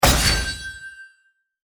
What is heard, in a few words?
trade requested; League of Legends; lol air client